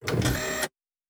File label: servo small, sci-fi sounds, mechanical